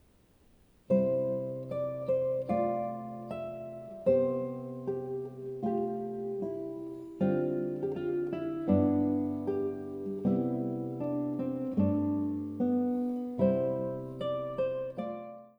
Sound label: lullaby, guitar